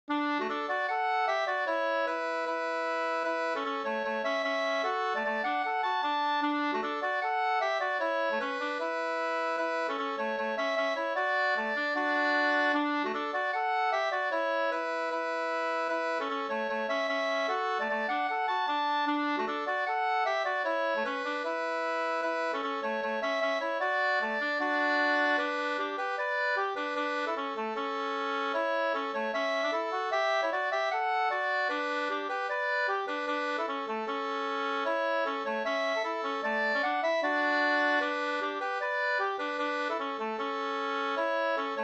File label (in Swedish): schottis; e Geting-Lasse Hs 2st